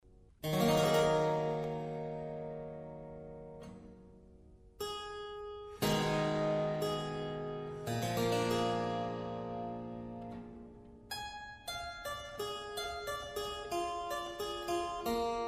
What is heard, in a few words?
Concerto in G major; BWV986 after an unknown German composer (Telemann)